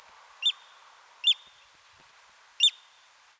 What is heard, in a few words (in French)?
huîtrier pie